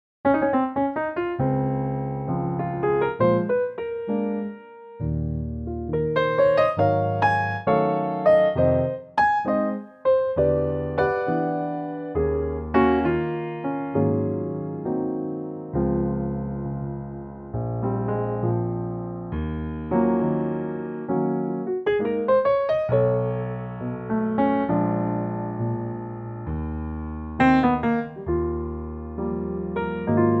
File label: Db piano; key - Db - vocal range - Ab to Db; Gorgeous piano only arrangement of this classic standard